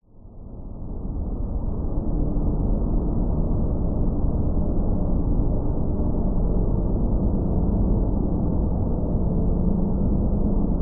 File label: science fiction atmosphere environment roomtone drone airy desolate